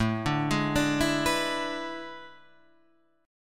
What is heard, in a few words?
A Major 7th Suspended 2nd Suspended 4th, Guitar, Half Step Down; AM7sus2sus4